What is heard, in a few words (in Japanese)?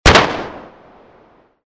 ハンドガン モノラル